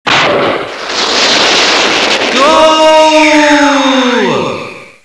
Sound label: GOAL; CD Action